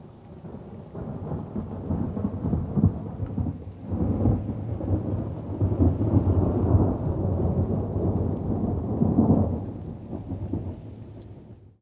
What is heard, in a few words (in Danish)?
Torden